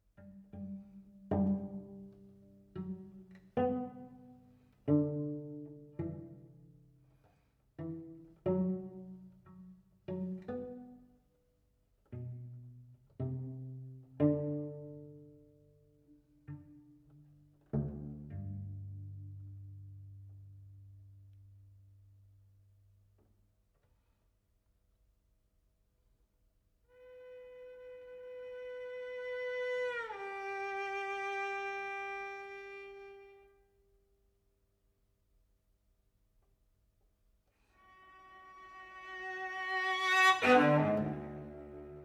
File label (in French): 50 notes en 3 Variations; Dusapin